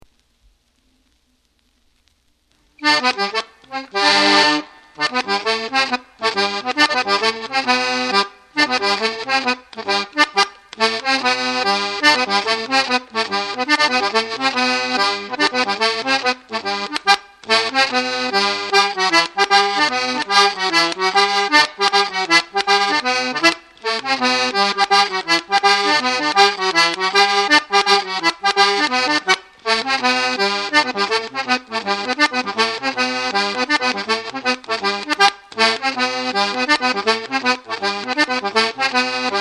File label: Jig; Pop Goes the Weasel